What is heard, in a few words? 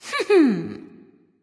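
"heh heh", nonverbal; smite; voicepack; khepri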